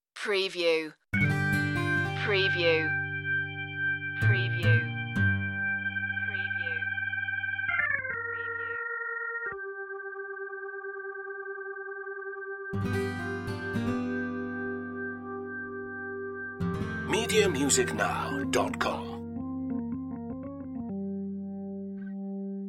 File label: life style tv light comedy